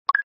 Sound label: snap up